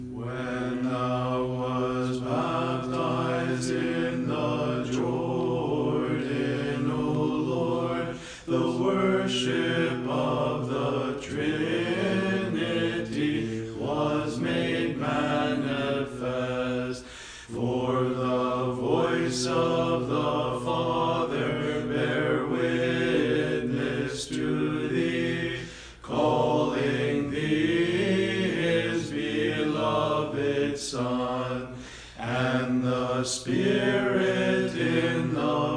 Dismissal Theophany Choir English Language Orthodox Church; St. Nicholas Choir